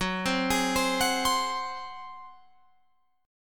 Gb Suspended 2nd Flat 5th Guitar Open F; arpeggiated

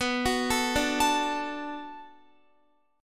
B Minor 7th B Guitar, chord; Bm7 arpeggiated

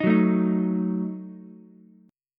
F sharp Minor 6th F sharp Ukulele; strummed; JGuitar

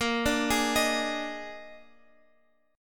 A sharp 11th chord

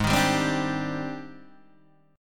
G sharp Major Sharp 11th, Guitar Standard; strummed; capo=3

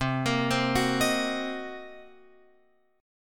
C Minor 7th Flat 5th Guitar Open F, chord; Cm7b5; arpeggiated

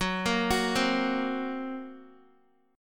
Gb Major Flat 5th, down, Guitar; arpeggiated; 4F,4A,5C,5G,5C,6E; JGuitar

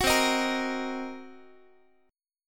C Minor 7th Flat 5th C down Guitar; strummed; Cm7b5; 21,20,18,20,x,x; 4A,4E,5A,5D,5G#,6C#

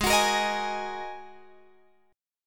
G sharp 7th Suspended 2nd, Guitar, Drop D; down; chord shape

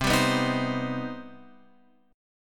C Minor Major 7th down Guitar Open F; strummed; JGuitar

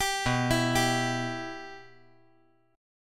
B Suspended 4th Sharp 5th B

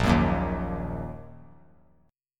A sharp Suspended 4th Sharp 5th down; strummed; A#sus4#5; JGuitar

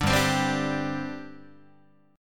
G Suspended 4th G down Guitar; strummed; JGuitar

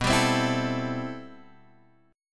C 6th B, C6/B chord; C6/B strummed

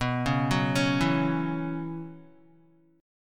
B Suspended 2nd Suspended 4th, Guitar; arpeggiated; JGuitar

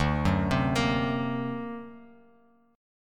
D Augmented 7th Bass Standard, chord; 10,9,10,15; arpeggiated; JGuitar

